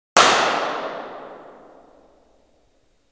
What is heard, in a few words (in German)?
Leer Clap, Hoerraum